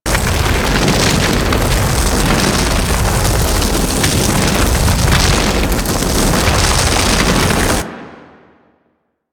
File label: Flame Thrower Loop; Sargas Ruk Flame Thrower